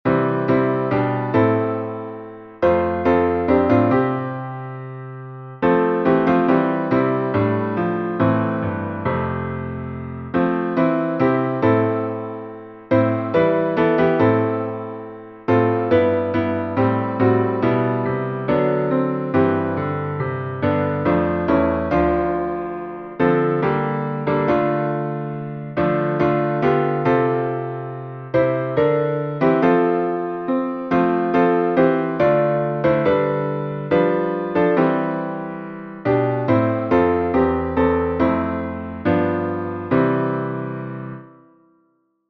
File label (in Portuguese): salmo instrumental